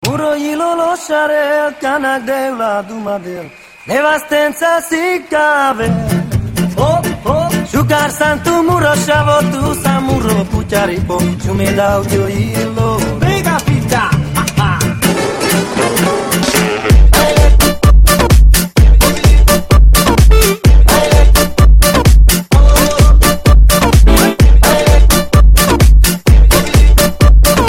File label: Gypsy Dance Club Mix